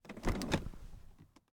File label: door open; Golden Crayon Sounds